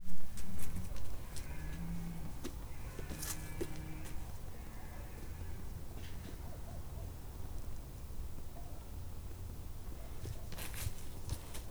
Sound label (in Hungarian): őz járkálóbak; budakeszi zoo 2010